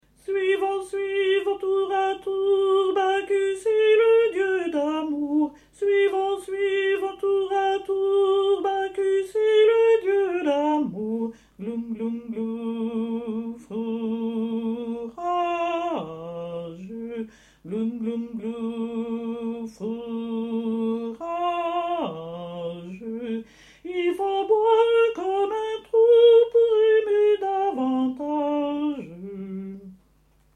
basses

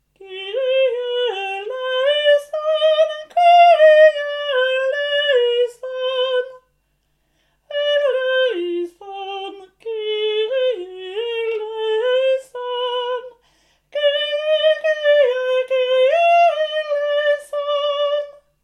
kyrie soprani; charpentier

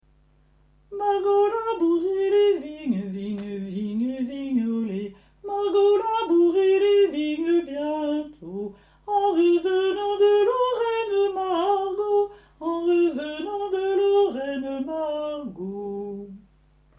basses

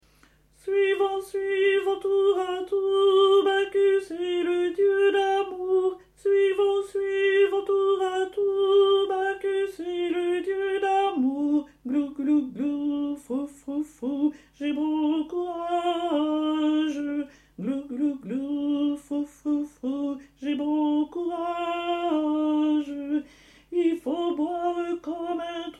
suivons tour a tour alti

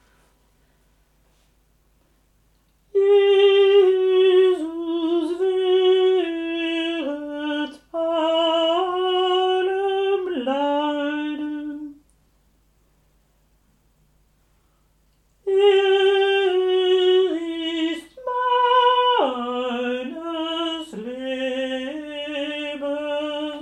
basses; jesus bleibet meine freude

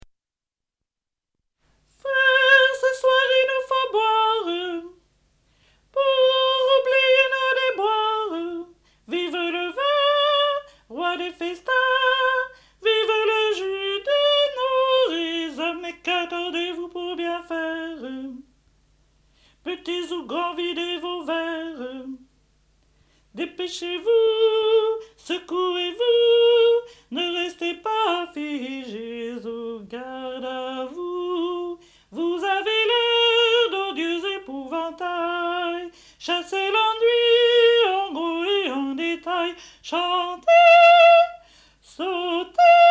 canon alti; mozart

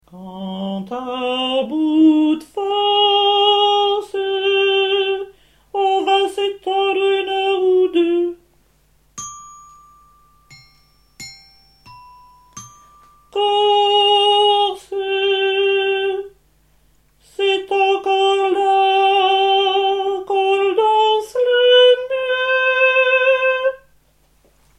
soprani

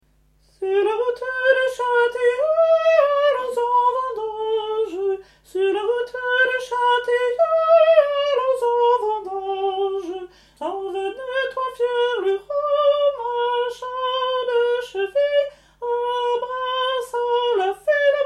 hommes

